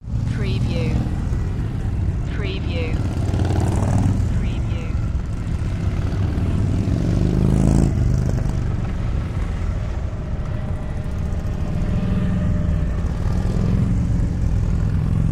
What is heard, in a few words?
Rolling Thunder